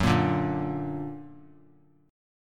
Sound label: F sharp Augmented; strummed; 3C,3F,3A,3D; 18,17,17,20; JGuitar